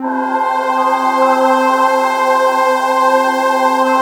PAD -L, 168 BPM Drum & Bass; Ueberschall; Drum & Bass Resonance

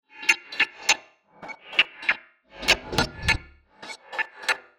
Side chain gated Topline (100BPM); creative gate; Loops; musicradar

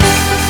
ORCHESTR HITZ

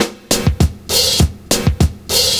70s Breakbeats; USB Soundscan vol.46; AKAI

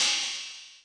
crash, Millenium MPS-400

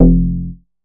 bass classic F; Polyend; ARC Noise

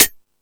High Hat, Drum Machines E-MU Pro-Cussion

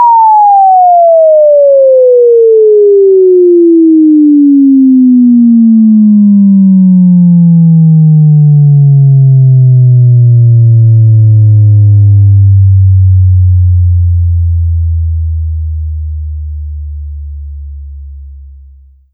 TOM SWEEP, ELEC DRUM; USB Soundscan vol.20; Fresh Disco House I; AKAI

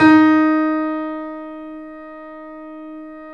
3D Pianos Yamaha C7; E-MU Producer Series Vol. 5

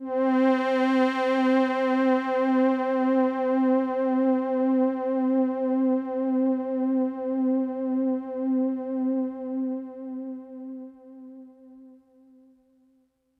Pad C, Synth Prophet One Shots; Electronisounds

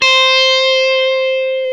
GTR Tube, Dirty; Club-50 - Foundations Roland

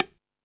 Jungel Percussion, E-MU Pro-Cussion

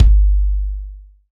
BD, DR BD; Best Service Gigapack 2

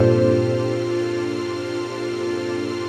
TRANCPAD; Best Service; XX Large Pads; Sample CDs

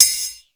CASH, METALIC DRM; Crypton - DrumBase5000; METALIC DRM2

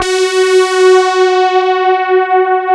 CMI PIANO

